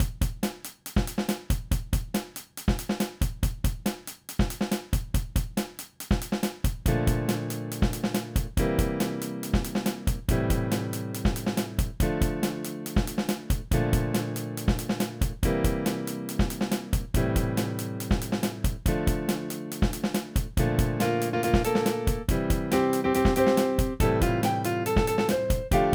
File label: Summer Days, A More visual novel type song.